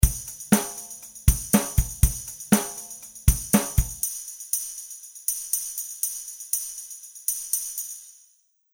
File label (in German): Tamburin Normal